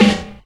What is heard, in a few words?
Snare, Percussion Hits, Snares